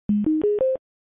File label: power on